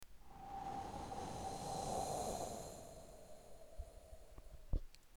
Wind; Assemblee SFX

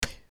hit; Assemblee SFX

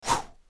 Woosh; Assemblee SFX

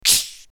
Shot; Assemblee SFX

